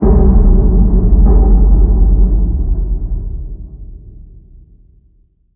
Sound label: Random Subterranean; moul